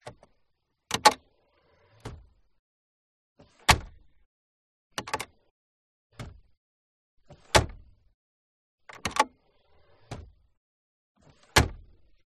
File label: AUTOMOBILE S-TYPE Jaguar Glove Compartment; 2000; fox audio